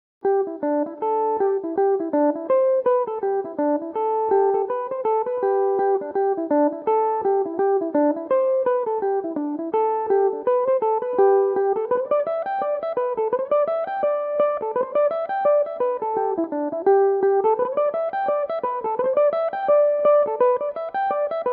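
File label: reel G major, Irish mandolin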